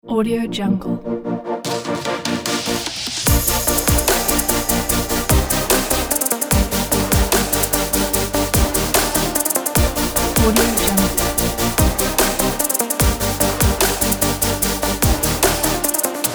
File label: future bass